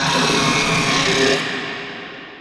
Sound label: inside battle antlion, prison; Half Life Model Pack; Half Life 2; ambient levels